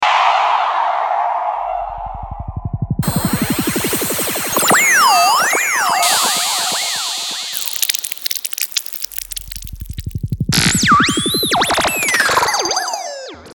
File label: One Shot